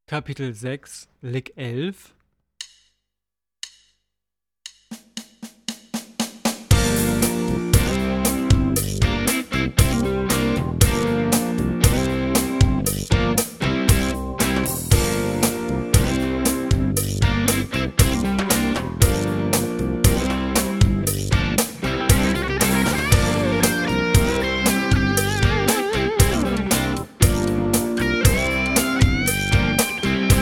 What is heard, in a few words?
Latin Style Guitar